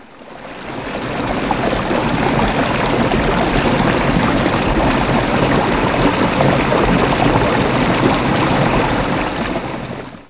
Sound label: running stream